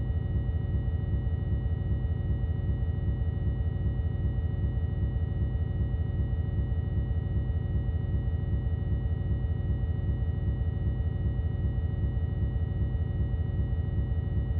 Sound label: large star 40 msol waves at surface